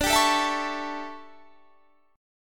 D Major 7th Suspended 2nd Suspended 4th D down Guitar Standard; DM7sus2sus4; strummed; JGuitar